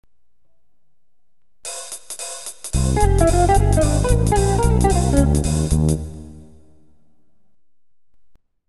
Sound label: jazz